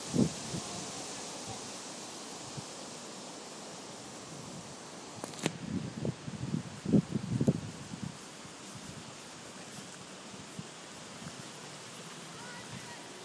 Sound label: windy bullrushes